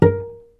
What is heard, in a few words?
pizz cello; DirectX SDK; DMusProd